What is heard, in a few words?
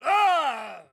Pain Death